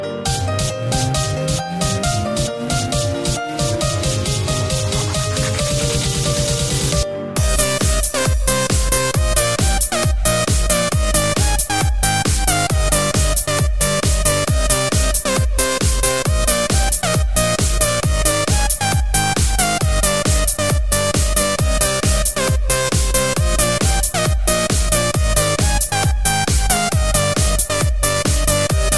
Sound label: DANCE PIANO